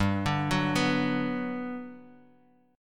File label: G Major 7th, G, down, Guitar, Open D